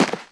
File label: land con, ambient